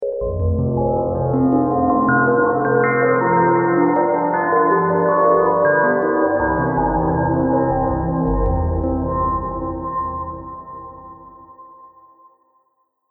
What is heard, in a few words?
Creepy Interlude, musical